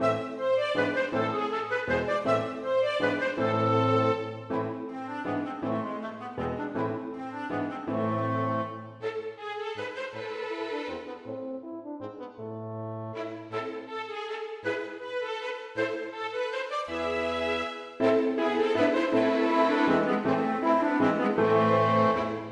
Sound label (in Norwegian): Menue for fiolin og lite ensemble; Bang